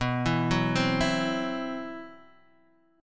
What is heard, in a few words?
C Major 7th Flat 5th B